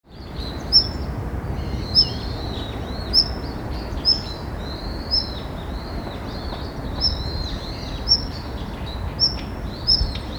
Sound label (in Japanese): jobitaki